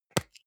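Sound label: deodorant can lid open medium; haus-garten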